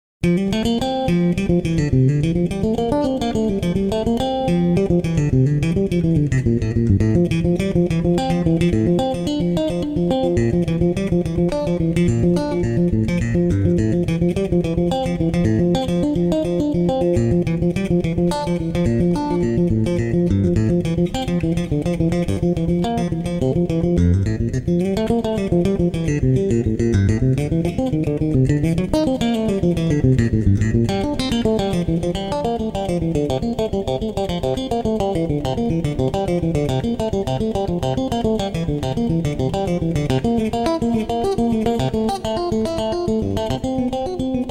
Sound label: Sonata in C Major